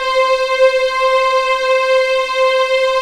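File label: C5 legato, The Ultimate String Collection; East West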